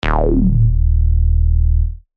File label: Acid Shot D#, synth shots tech house; Swashbuckler; revealed